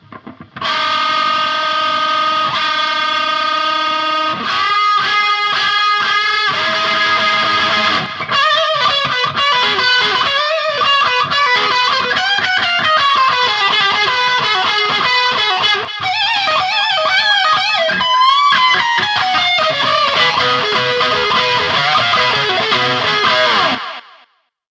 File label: processed lead guitar with fx